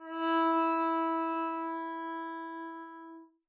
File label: arco e4 p down, double bass; dsmolken